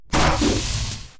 explosion; fowl-play; slingshot

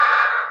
woodpecker; fowl-play; enemies

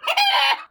teleport; fowl-play; hennifer; enemies

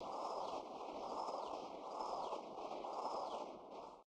dash continued, enemies; fowl-play; boreass

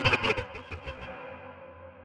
centipede; fowl-play; elder-centipede; enemies

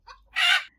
retreat; fowl-play; hennifer; enemies

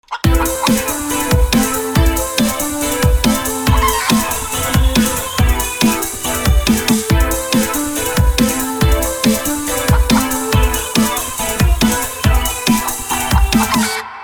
victory song; fowl-play; victory theme

